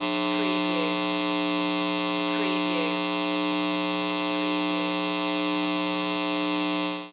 Electric High Voltage Lines

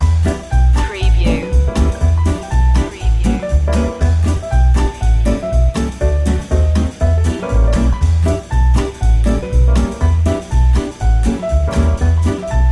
here comes that jazz loop

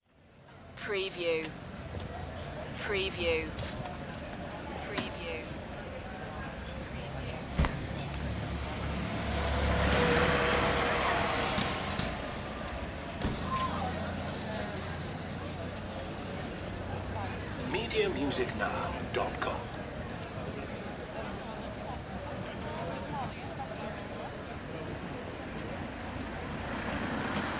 Crowd, City Ambience Light Evening

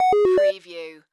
Chime Musical Vintage

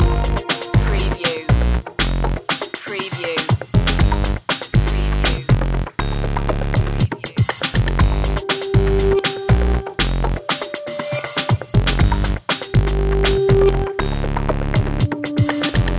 hip loop

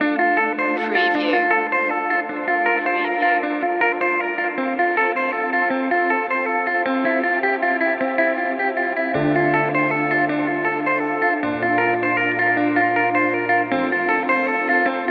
guitar arpeggio loop

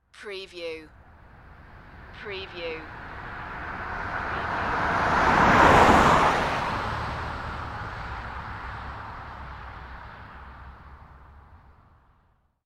Auto Pass By Stereo; sound effects bible

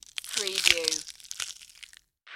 Mummy Limb Rip Juicy; sound-effects-bible

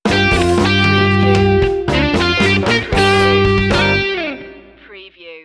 cocktail funk stinger

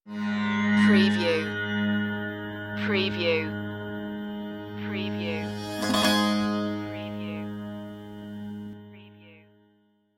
eastern pulses stinger

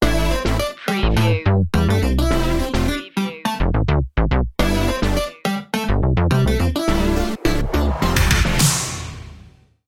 beat machine stinger

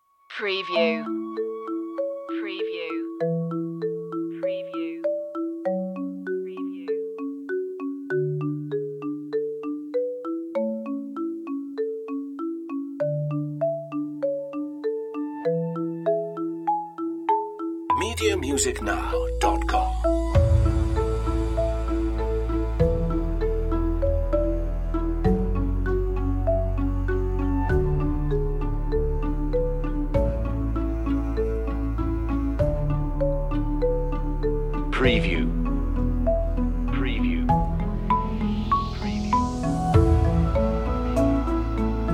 love and joy less lead no strings

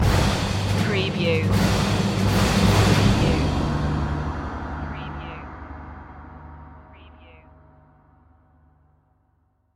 battle march stinger

